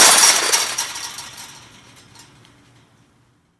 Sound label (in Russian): rezkij silnij zvuk drebezzhaniya posle udara